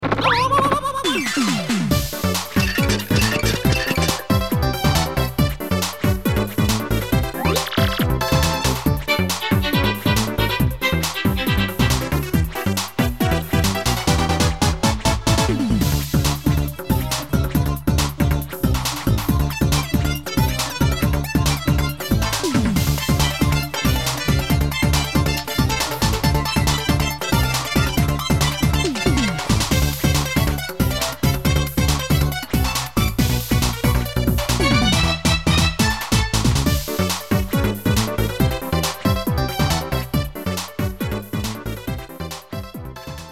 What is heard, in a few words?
battle; Paper Mario 2 The Thousand Year Door